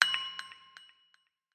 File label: Ripple